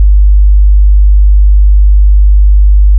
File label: 50Hz Sinus